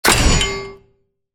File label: axe hammer